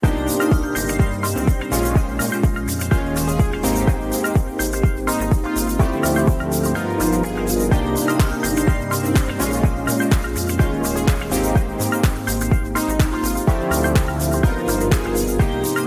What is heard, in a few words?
Samsung Galaxy J7 ringtone